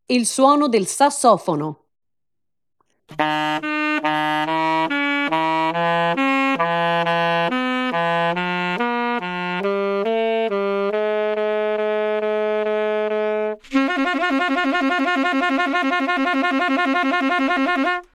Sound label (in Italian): sassofono